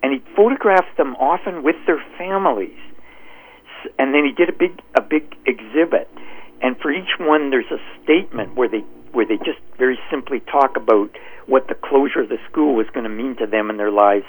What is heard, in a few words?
David Thompson University Centre closure